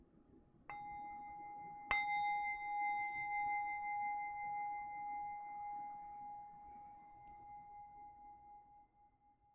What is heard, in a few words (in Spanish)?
campana ASMR Sonidos Tibetanos